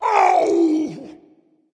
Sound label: ouch dragon; fafnir